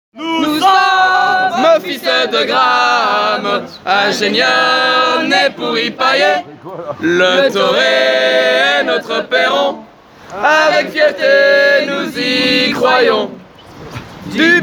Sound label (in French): Chant